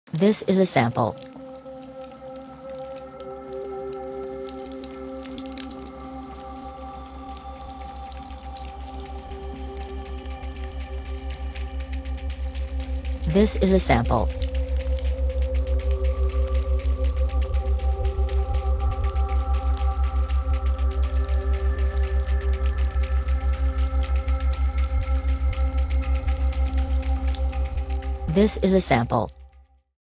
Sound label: 528 Hz Singing of the Ancients